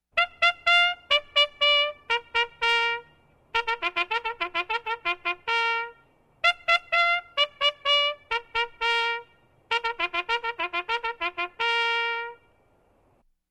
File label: Trumpet Assembly